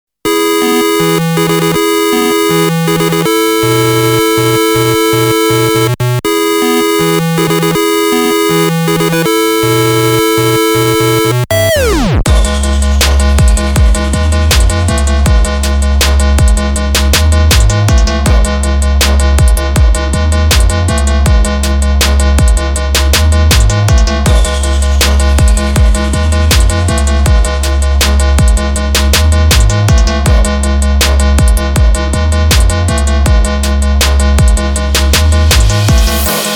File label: BEAT